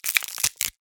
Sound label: bones cracking; incredible-race